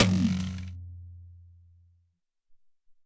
Rcht Tom, Alesis SR-18

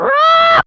Cocky, Animals; Fairlight CMI IIe

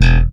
Bass, pull E1; Sampleheads; Will Lee Bass Library

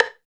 LO STIK; East West; Phat & Phunky

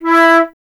flute E

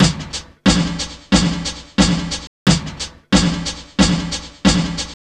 snaresnare 090bpm; Zero-G; Downbeat Dance Grooves

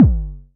Multi-Kick Short

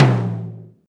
tom, Alesis DM5

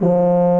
F3 TUBA

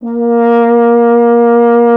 F. Horn; Miroslav Vitous; Solo Instruments VOL-2

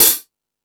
Ambient Rock, drum machine; E-MU Pro-Cussion-1

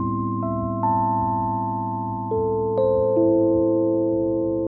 E.PIANO, KEYS

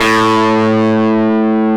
DIST GT, GUITAR; AKAI S-Series CD-ROM Sound Library VOL-8

